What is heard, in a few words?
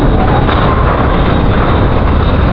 big move, plats; hl2